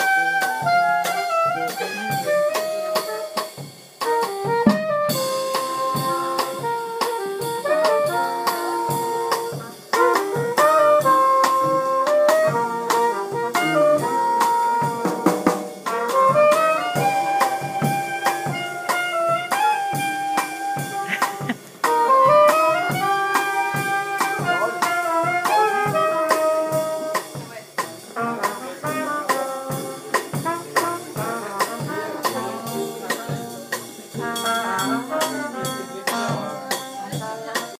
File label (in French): La Recree Nouveau Theme Sur Basse, brassband